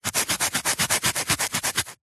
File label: abrasive foam rub clean or sand concrete; foley